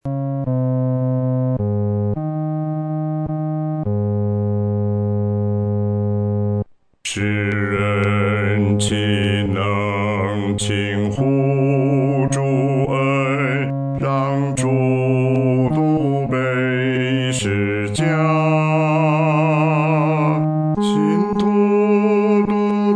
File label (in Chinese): 独唱 第四声; 岂能让主独背十架